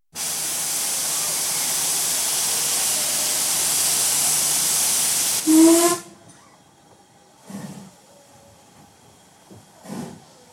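Steam Train starts into constant run, various acoustic changes as train passes through cuttings, etc, slows & stops in station; Seaside Special, recorded leaning out of carriage window; BBC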